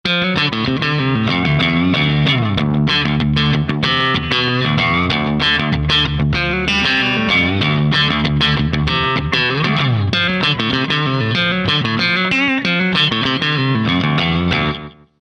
Analog Man Bi Comprossor, Boss DD3200R, Roland JC120s